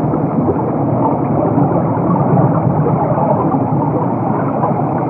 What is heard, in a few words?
Pipe Full Flow